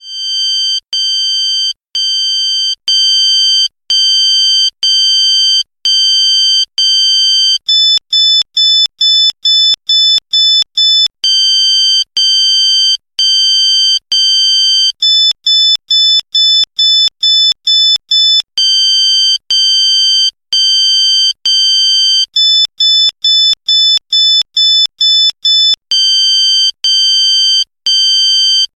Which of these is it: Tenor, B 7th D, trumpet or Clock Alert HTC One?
Clock Alert HTC One